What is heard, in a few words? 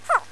film